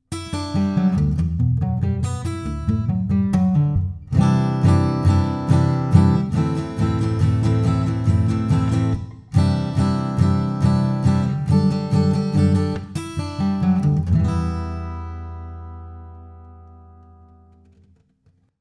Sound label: Acoustic; Blade 2